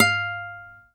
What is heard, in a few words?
GTR F4 HARD, Nylon Guitar